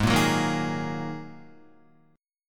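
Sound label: A flat 7th Suspended 2nd A flat Guitar, chord; Ab7sus2 strummed; 4,1,4,3,3,3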